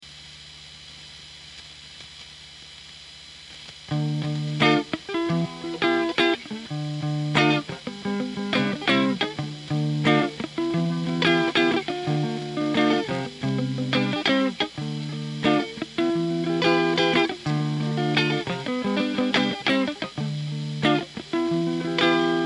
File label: riff